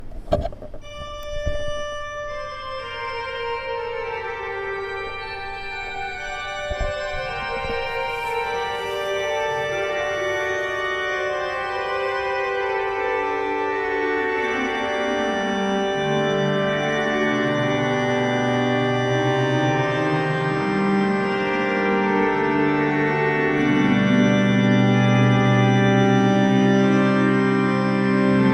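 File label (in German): Brandt, pfeifenorgeln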